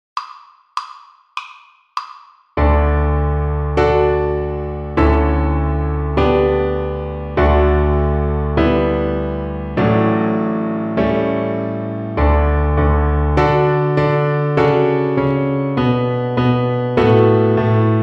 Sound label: String Gym, Piano Accompaniments